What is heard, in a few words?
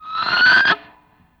GTR, GUITAR FX